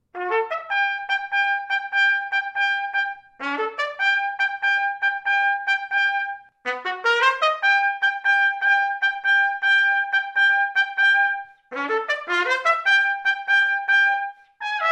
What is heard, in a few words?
Fanfare, trumpet